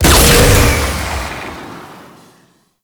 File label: Rocket Launcher Fire 3D; Warframe; Weapons